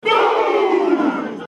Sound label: club dub boom